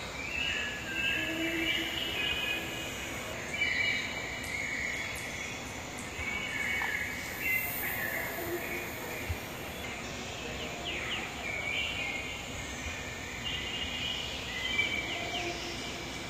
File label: jungle base loop; Source Engine; tf; lair